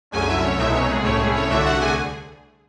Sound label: start